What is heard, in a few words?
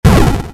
Boom Large